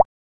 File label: cartoon